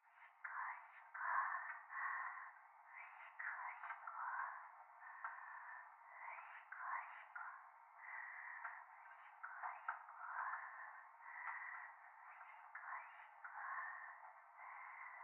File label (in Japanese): トイレ しこしこ 電車